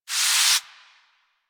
Ocean Steam Blast; Warframe; Grineer Ocean

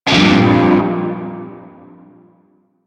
Shawzin Phrygian Chord J; Music; Warframe; Narmer Shawzin

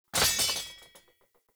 Short Sword Drop; Weapons; Enemy; Swords; Sinecure Short Sword

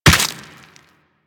Run Bone, Footsteps; Warframe; Operator

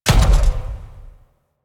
Mech Step Run; Warframe; Vehicles; NechroMech

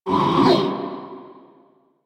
Impale Cast Vocal; Warframe; Warframes; Hoplite

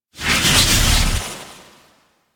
storm back flip; Warframe; Warframes; Ninja

